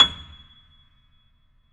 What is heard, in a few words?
Bosendorfer, Keyboards; E-MU Producer Series Vol. 2 – More Studio Essentials